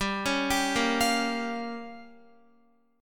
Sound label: G Minor 7th Flat 5th, Guitar; Gm7b5; arpeggiated; x,10,11,12,10,12